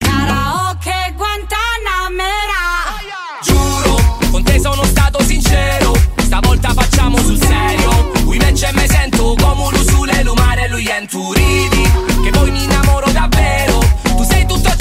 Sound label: Karaoke